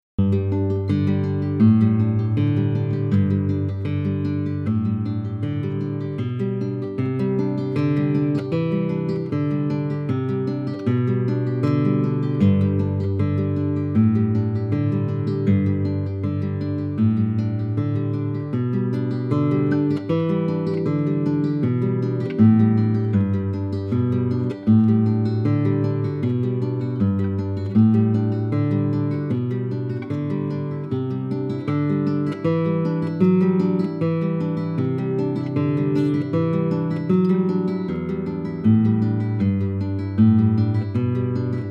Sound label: Classical Guitar, Etude; Pujol